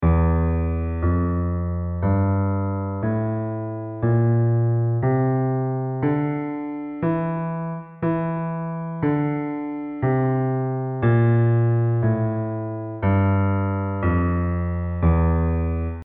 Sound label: Locrian; ear-training